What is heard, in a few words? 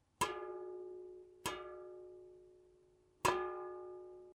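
metallic clang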